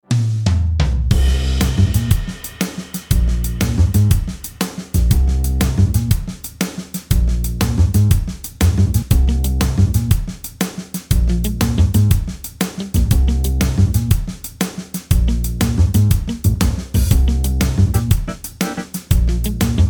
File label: Jazz